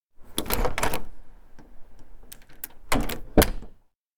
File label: door open close postman; anemoi